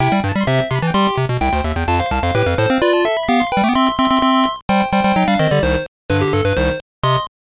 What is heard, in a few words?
Mission Failed!; The Grinch; GBC